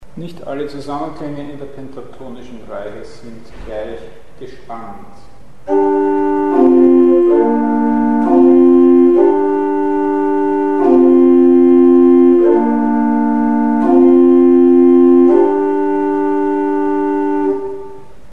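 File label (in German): Spannungsverhältnisse in Pentatonik; Mikrobuch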